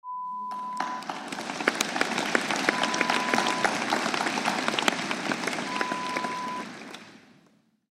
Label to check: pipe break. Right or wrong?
Wrong